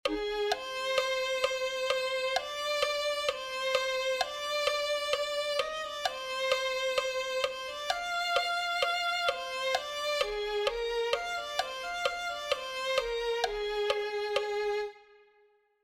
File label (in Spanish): clarinete